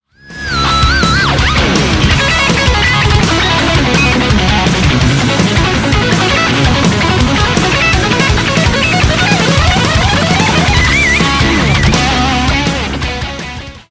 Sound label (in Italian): Assolo, guitar